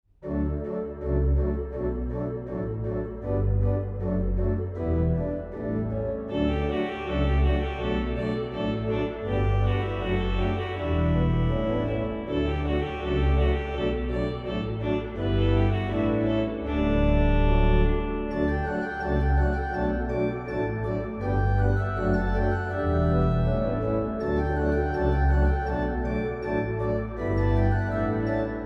trumpet tune, organ

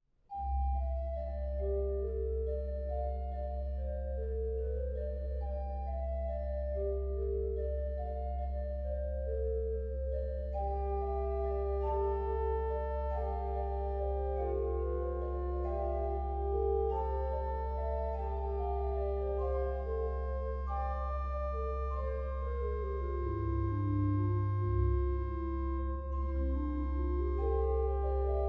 meditation veni creator spiritus, organist; David Lasky

